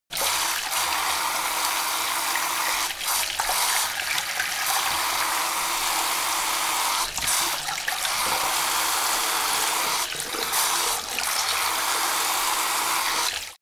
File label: sink forced flow wash; haus garten; badezimmer